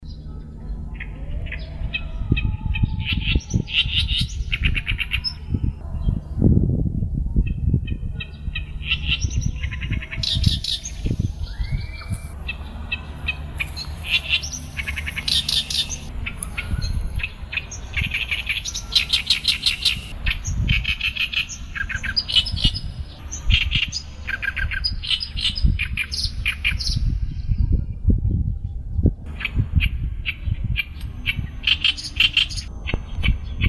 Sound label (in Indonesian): kerakbasi besar